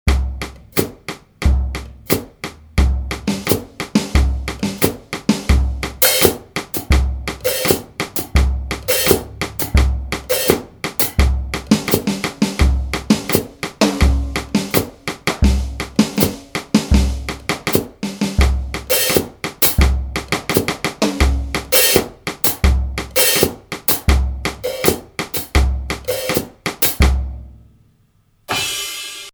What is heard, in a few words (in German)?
Kopfhoerer Sound Roland